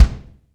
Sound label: subtle reverb kick sound D# key; kick drum